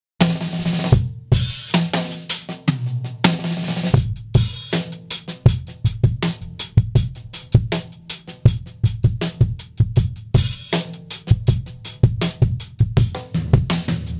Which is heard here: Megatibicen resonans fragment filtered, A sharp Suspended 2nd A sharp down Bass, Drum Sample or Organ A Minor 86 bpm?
Drum Sample